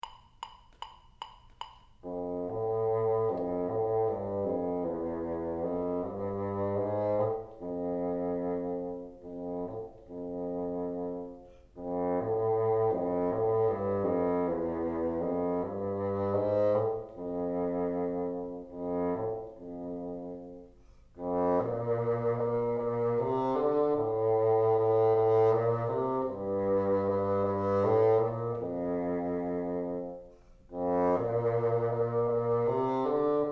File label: teacher, bassoon